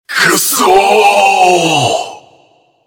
die; graveman

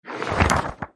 elite Enter

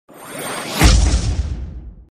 skill bullet; rocker

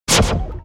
skill attack; eaststar

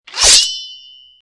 sword out

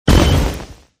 skill bullet; ghostfire

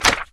open Mail

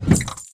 click; Shop

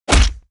sound attack hit; hitsound

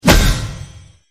skill fall

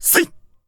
attack; blademaster

